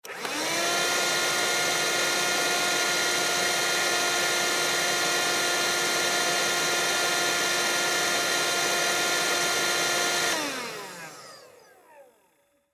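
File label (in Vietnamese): Tiếng dùng máy hút bụi cầm tay để hút sạch bụi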